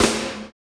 snare flam; Roland L-CD701; Snares 6; Sn Modules 6

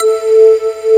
digi decay

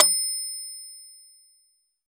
Metronome Bell; Roland BK-5

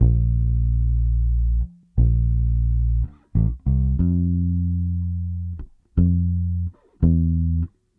Bass, 70s Analog Funk

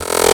Buzz Fill, Drums & Percussion; Percussion Fills; FX; Sonic Foundry (Sony Creative Software); Futurist Drum & Bass

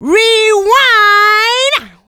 mixed vocals; Houseworx

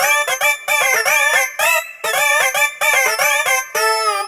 Cheeze Riff, Keyboards

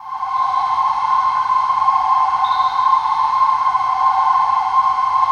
CRIK CHOIR; Distored Reality

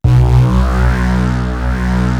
TAURUS BASS; East West; Global Sounds; DIGIBASS

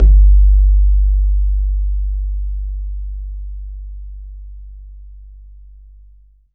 BAYAN; Q Up Arts; Denny Jaeger Private Collection

